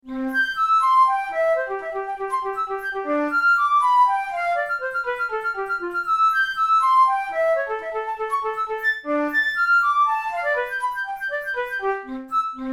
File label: studies for the boehm flute; drouet 72